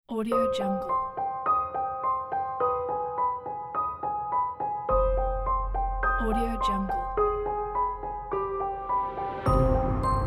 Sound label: the scary logo